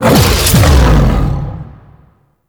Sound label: Howl Cast; Warframe; Voruna